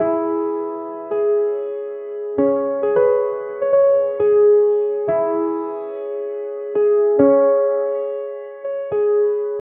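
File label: waves piano; instrument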